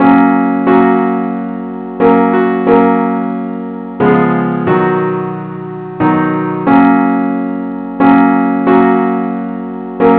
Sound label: simple acc key fis